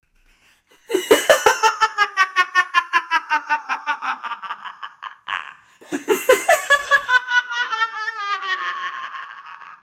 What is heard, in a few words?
laughing sound effect, adult